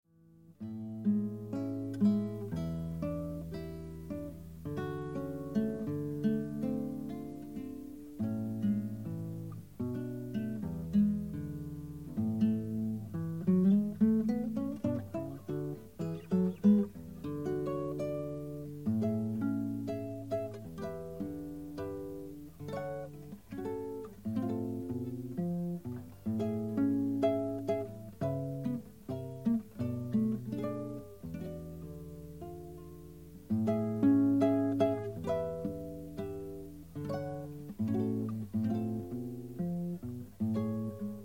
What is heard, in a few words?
viola solo guitar